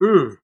"mmm", Voice; ooh ahh

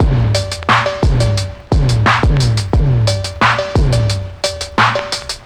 Drum loops Electro

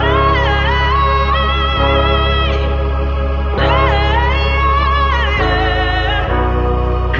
voice and piano lotsa yeahs; Loops; Phonky

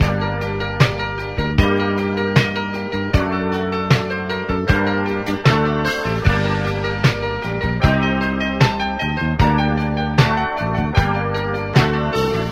full pop ditty, Loops, Sad

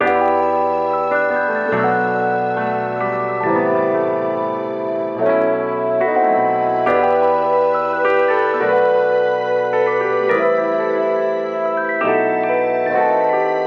keys, Phonky loops

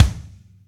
Kicks Loud

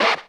bark

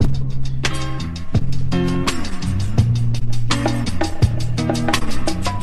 guitar, Loops

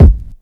blam, Kicks Loud

